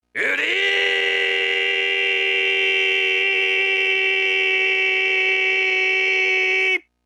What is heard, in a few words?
wryyyy long